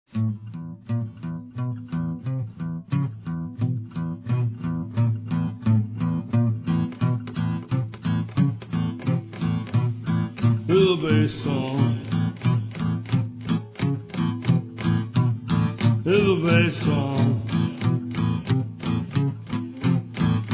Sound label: Bass Song